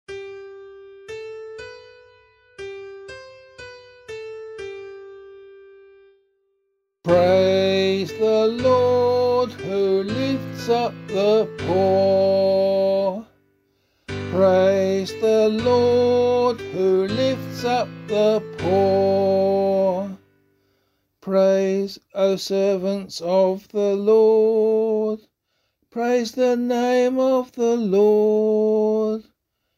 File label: Psalm C vocal